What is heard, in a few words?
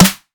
Sky Snare